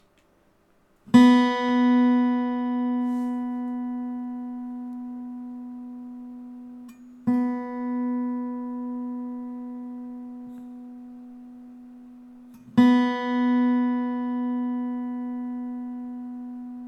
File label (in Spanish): Sonido de guitarra acústica cuerda 2 Si B; afinar una guitarra